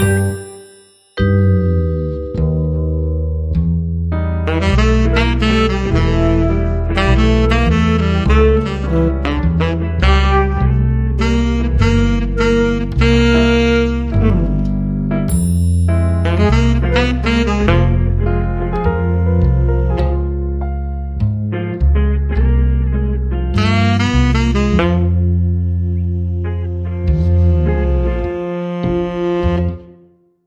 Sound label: Mystery Horn